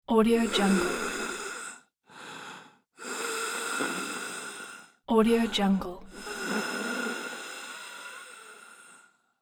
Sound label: dying breath male